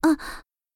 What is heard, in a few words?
Sorrow